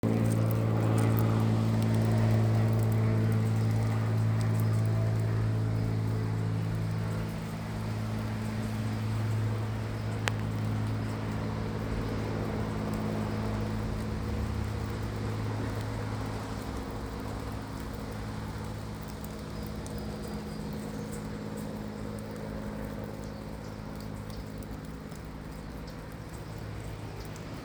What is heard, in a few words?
Plane